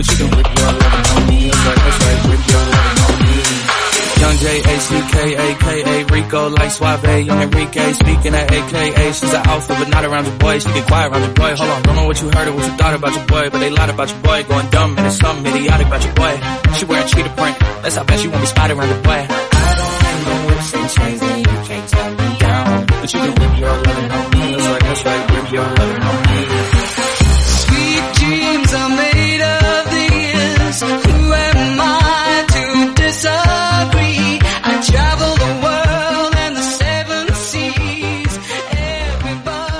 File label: 125bpm Cm